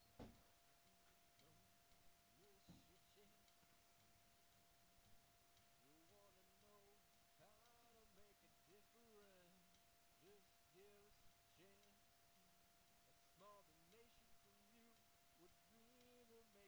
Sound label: vocals